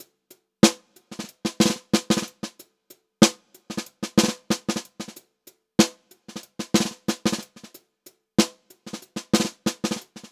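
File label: groovy variation, Funk-Groove Drumloops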